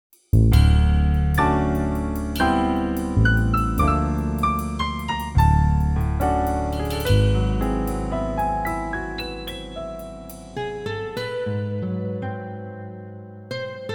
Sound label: session; 16-bit, 44.1 kHz stereo; xp-80; Multi-patch demo; roland; roland demo cd-3